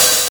natural open hat sample d key, Royality free open hi hat sample tuned to the D note